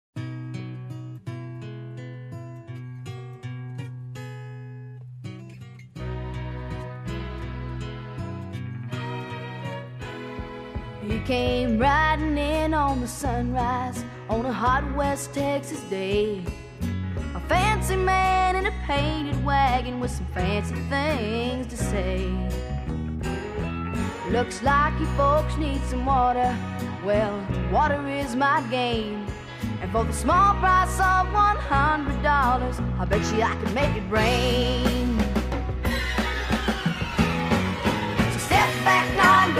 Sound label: Country; 1975